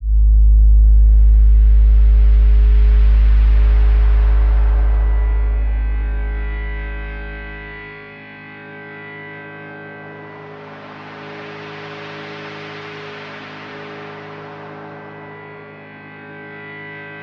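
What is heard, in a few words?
Intro Pad, 95bpm, G minor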